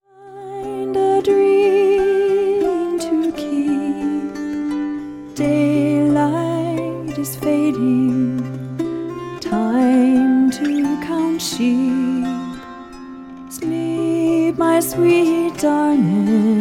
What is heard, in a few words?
Lullaby